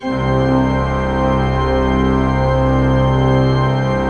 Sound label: Cathedral Organ; Propeller Island